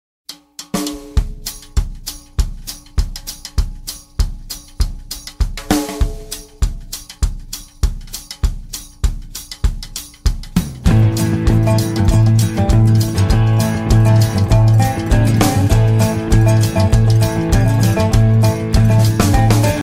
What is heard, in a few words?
instrumental beginning